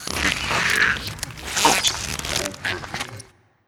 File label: Jar